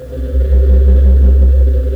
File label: creeps